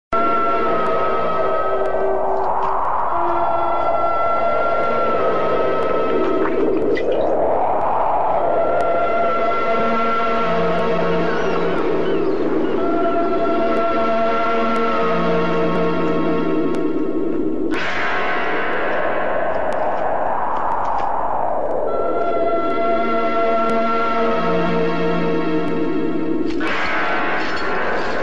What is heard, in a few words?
Horror Theme